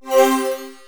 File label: spell